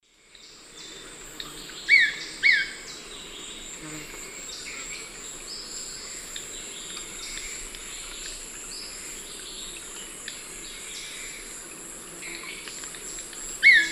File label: Cnipodectes subbrunneus, call; S. America; Eastern Lowlands